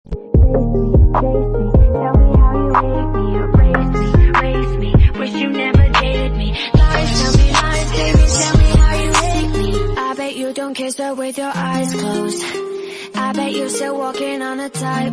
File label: English Music Ringtone; ringtones